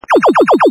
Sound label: Rapid Laser